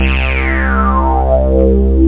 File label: 303 slow & looped; tb303 samples